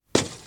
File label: crash